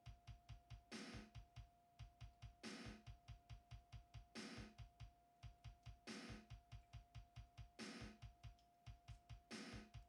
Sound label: Recorded Drums Recording; Sony ACID Pro 6.0